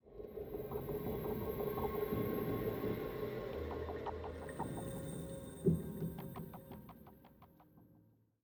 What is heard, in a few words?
85bpm; Legends of Pegasus; windows gamerip 2012; Research High